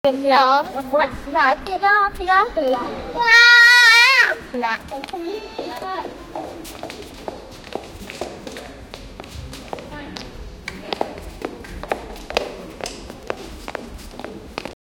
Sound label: a girl in heels with